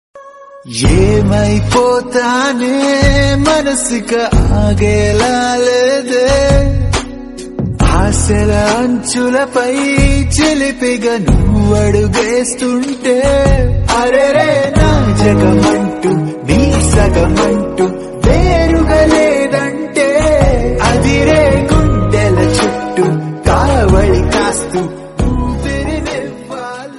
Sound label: song